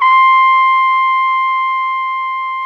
BRASS C5, ORCH BRASS; Greytsounds VOL-1; ORCH BRASS 2

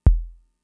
Kick, Drum Machines Fricke MFB-522

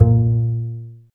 STR CB JZ, Cb JazzPizz; Roland - LA Composer vol. 1

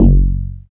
BAS FM DX-7

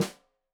Snare Stereo, Drums; Deeply Sampled Drums

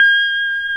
PEDAL STEEL

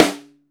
ST AMB SN, STEREO AMB SNARE

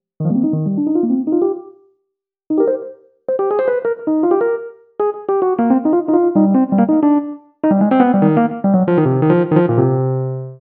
Rhodes Solo, Cinematic Funk; Sony Creative Software; Urban Tone Poems; Some Water

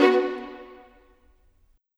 Violins, Orchestral; Sonic Foundry (Sony Creative Software); Orchestral VOL-2; Modern 10

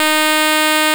Pro 1 SQR EB4, Vintage Keyboards; Big Fish Audio; First Call

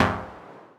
Fm Dub Chord

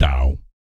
BASS FX, Vocal Planet, Jazz FX; Spectrasonics

